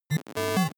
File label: broken computer random fraction